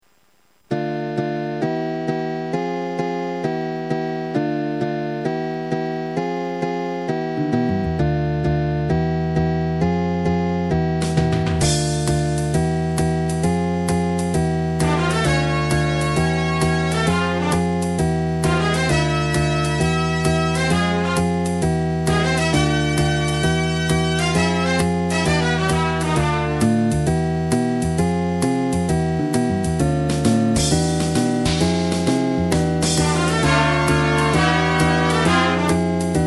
17WT jazz

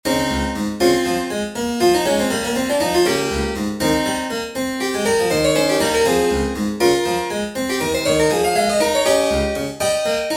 Diasem Lydian Example 21edo

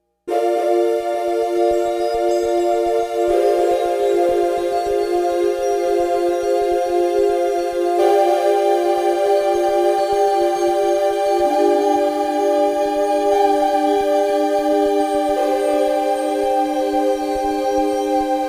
Qt mode chord prog

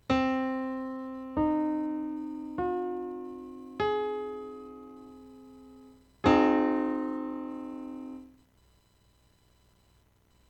Orwell Tetrad 22edo

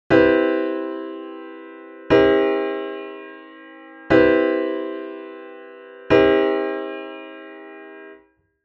counterpoint seventh chords; Sqrt(25/24)

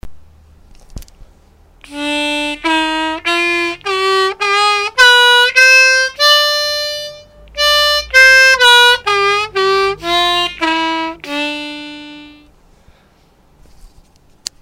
Harmonica Bayati Shuri

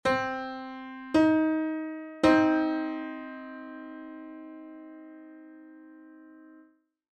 Piano 15edo

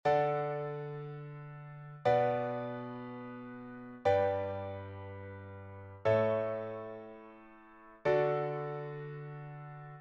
Plain I - vi - IV - V audio

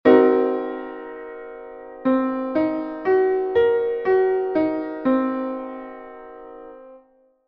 Cm7b5 (19-EDO)

